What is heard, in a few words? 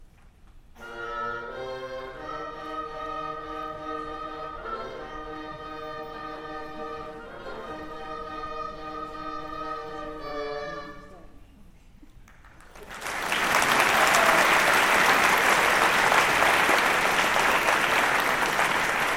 Double Reed Feature, Beginner Winter Concert; Oboe & Bassoon Feature; Knight Band